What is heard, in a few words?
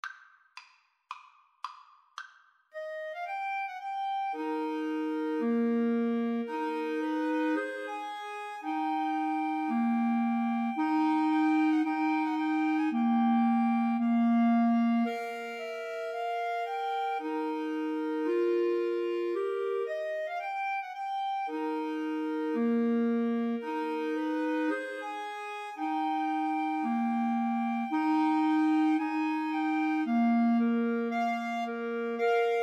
Clarinet Trio